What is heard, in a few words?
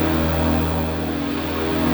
Guitare Loop; GAUGE